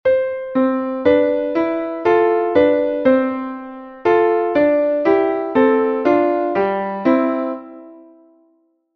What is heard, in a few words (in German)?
gegenbewegung; dommer 1865; Gegenbewegung ist das Gegenteil der Parallelbewegung